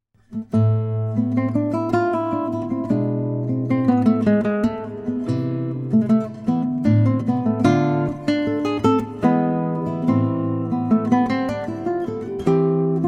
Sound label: Courante